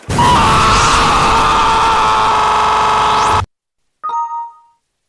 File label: ahhhhhh